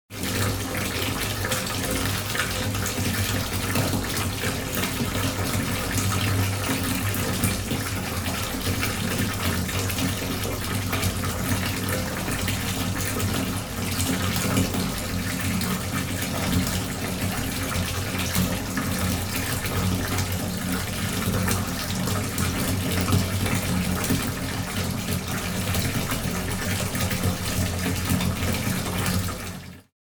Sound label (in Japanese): ohuro